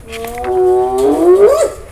hyena

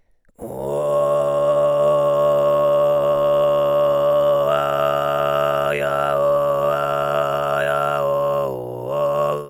kargyraa

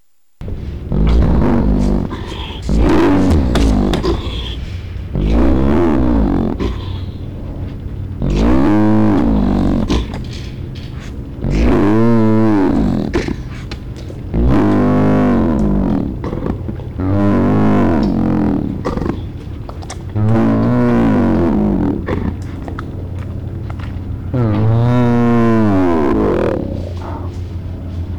hyena eeyore cub

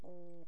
Toadfish; Putland

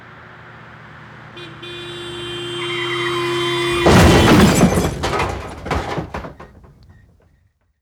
Car Crash Original

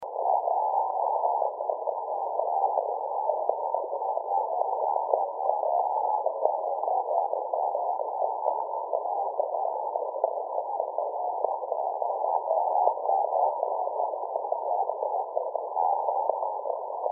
recording quacks impulsions

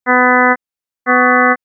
sine wave

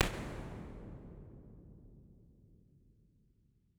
Sound Aula

